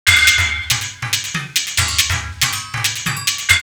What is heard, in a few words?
R8 On D Beat 140, drumloops, DrumMachines; X-Static Goldmine